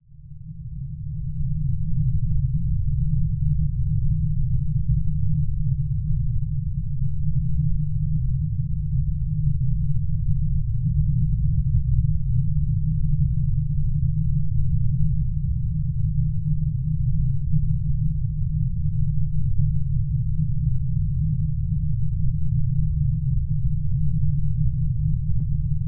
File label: drone